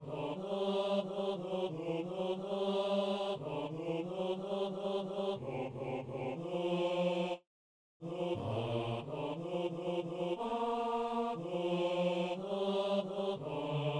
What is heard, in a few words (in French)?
basse; Allez par toute la terre